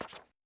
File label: Pick up card photo; The 39 Steps